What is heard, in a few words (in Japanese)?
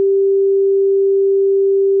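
G4 392, サンプルとしてC4とG4をリンク, サイン波; 平均律と純正律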